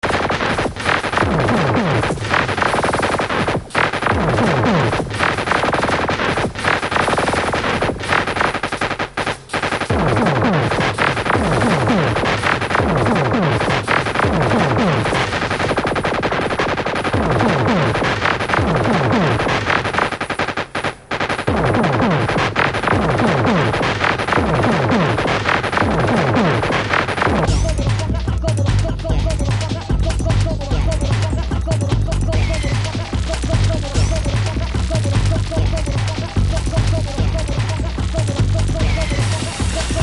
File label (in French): son urbain